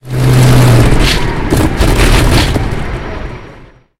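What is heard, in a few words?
engine griffon stop cockpit right; War Thunder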